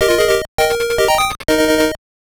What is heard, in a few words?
Jingle; kuni chan no game tengoku game gear